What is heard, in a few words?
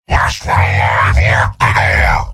mortar; fat soldier